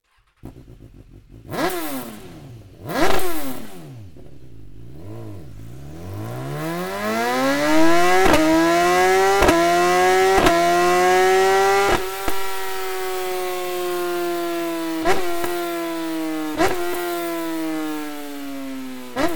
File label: exhaust headers bmw s1000 xr s1000 r; akrapovic